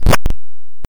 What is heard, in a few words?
arrow drop; ludumdare; ld31